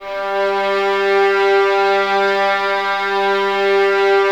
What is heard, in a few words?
VS G 3, Violins; Miroslav Vitous; 11 Violins